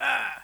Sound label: Ouch